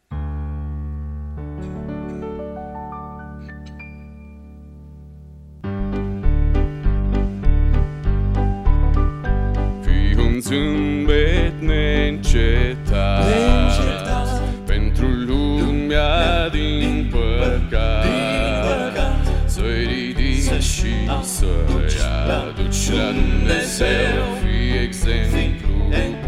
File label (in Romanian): Quintet vocal; Arad; Duminica dimineata - nunta